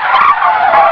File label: ski; NewSurfs